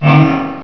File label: VOOM; The Ultimate Guide to Mortal Kombat